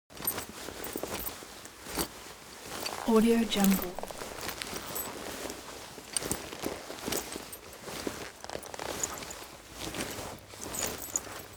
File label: cloth leather metal handling sequence; foley; smartsoundfx